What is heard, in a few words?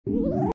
super jump charge